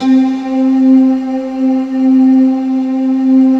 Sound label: PIANINO C3